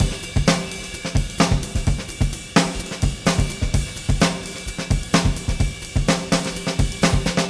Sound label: Beat Loops; Zero-G; Monster Beats